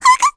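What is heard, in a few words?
Vox Damage, Korean; Lavril; kingsraid; voices heroes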